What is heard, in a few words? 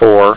time clock